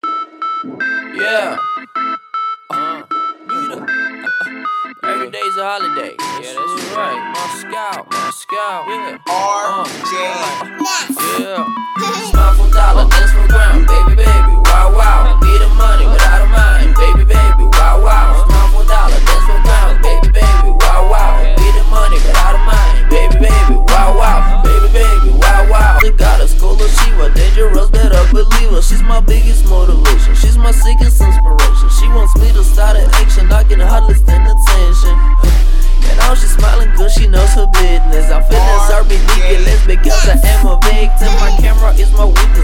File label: low bass 30 40 Hz; Midway